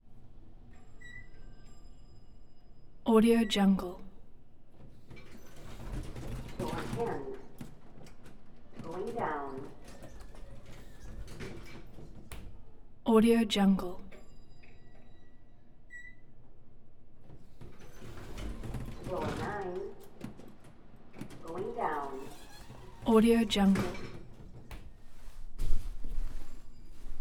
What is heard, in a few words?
building elevator ride; fbi; collanderson